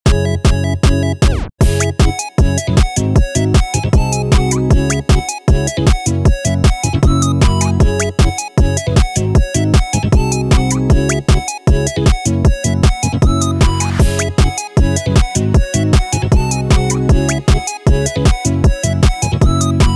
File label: Pluggnb; NCS Copyright Free Music